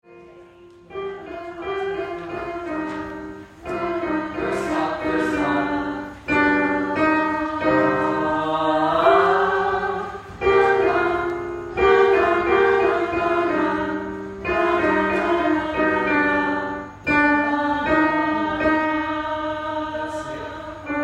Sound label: alto